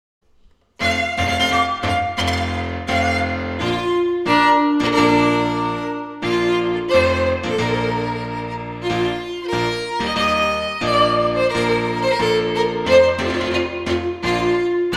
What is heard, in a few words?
Bridal Chorus - Violin - Piano; Acoustic Duo